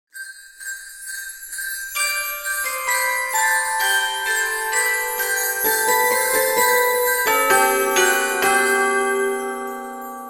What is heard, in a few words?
deck the halls ringtone